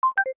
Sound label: Undock; Samsung; Z2